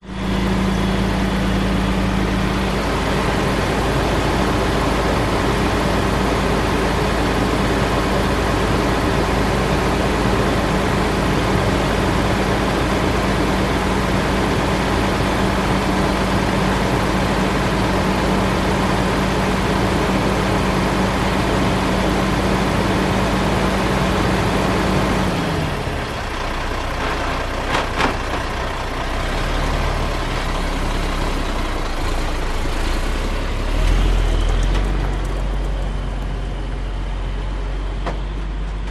truck articulated idle close away followed by long trailer; vehicles